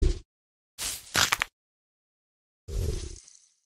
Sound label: crack neck